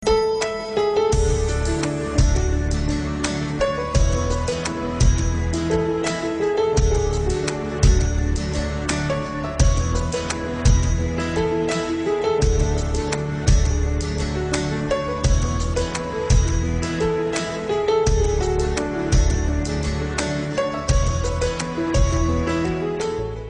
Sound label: Lovely Ringtone